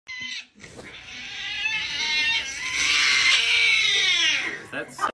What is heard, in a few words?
screaming cat